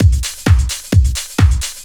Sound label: drum 130 trance